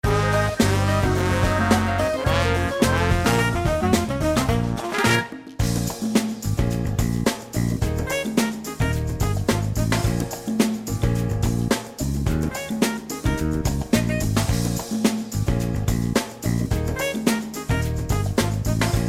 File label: Bonus Lose, Failing a bonus round; DKCTF